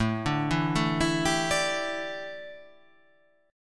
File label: A 7th Suspended 4th, chord